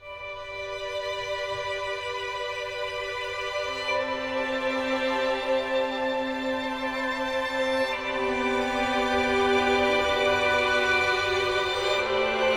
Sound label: Tenderness Ambient